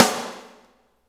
SNR PICC, Snares; Roland - Rhythm Section

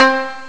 d110 koto; Amiga Soundtracker Sample Packs

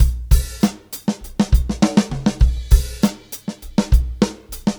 Live Beats, R&B Drums; Sonic Foundry (Sony Creative Software)

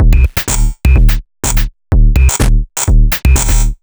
Drum Loops, 125 BPM

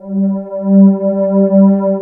RUST PAD G3, MKS 70; Northstar - New Gold VOL-1

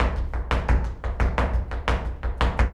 Flamenco, Tapping, Zapateado Solo

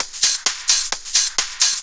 drum 130, techno trance drumloops

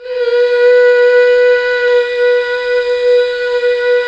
MMHH, BACK. MMMHH Fem

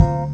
Bell Hit; Proteus 2000; Digital Sound Factory Proteus 2000

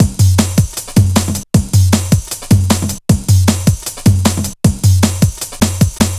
155, breaks, Loops

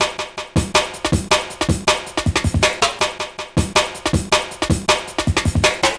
160, Drum Loops; Total Drum&Bass

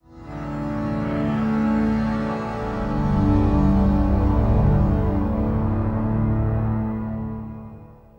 Ground Loop, Acoustic Drone; Sonic Foundry (Sony Creative Software) - Friendly Fire; DroneLoop

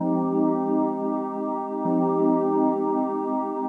130 C trance break; Trance Explosion Vol1

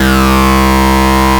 FAT FUZZ, Synthy Tonal; Distored Reality

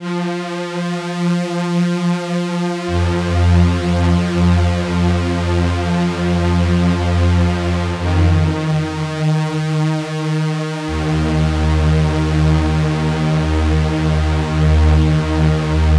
Pad, Trance; Sonic Foundry (Sony Creative Software); Trance NRG VOL-2

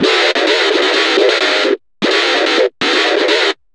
GTR LOOPS

